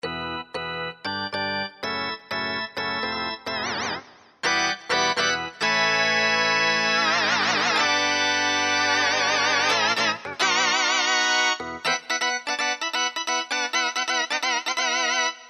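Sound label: Distortion Organ, Roland JD-990; Factory Patches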